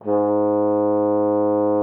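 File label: French horn G#3; Xsample VOL-3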